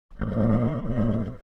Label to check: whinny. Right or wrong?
Right